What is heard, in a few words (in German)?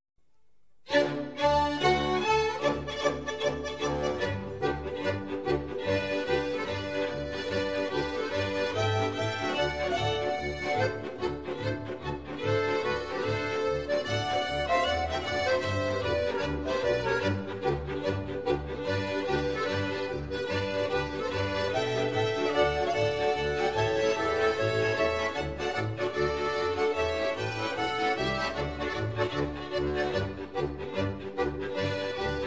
Polka